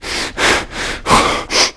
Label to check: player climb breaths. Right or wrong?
Right